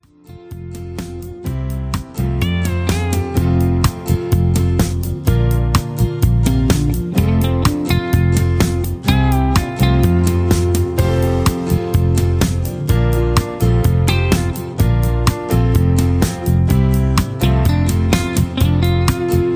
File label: Instrumental No Leads